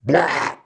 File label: bleaugh